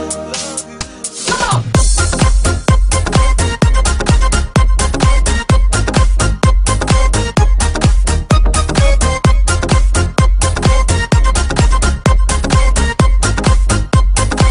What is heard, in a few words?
dance club